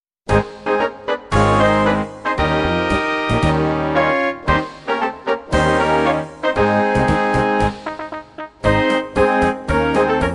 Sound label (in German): Polka